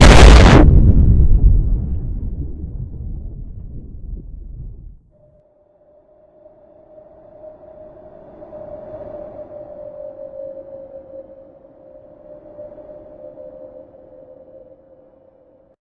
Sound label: scp nuke; cs16; ze scp escape